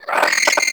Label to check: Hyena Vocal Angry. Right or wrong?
Right